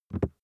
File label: plastic cream bottle hit soft